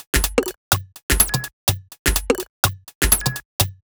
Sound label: Top C 125bpm, House Drumloops; 90 s Sample CDs; Zero-G House Fabrik Drumloops; ZG Drumloops